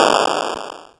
snr; Mono

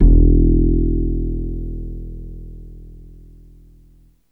upright (E), bass; Sonic Foundry (Sony Creative Software); Ugly Remnants VOL-2; Basstuff

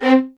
LDVLN1 ATT C, LOUD VIOLINS; Q Up Arts; Denny Jaeger Master Violin Library

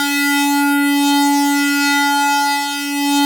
SYN ANA, Analog; Sound & Vision; Gigapack I CD 2

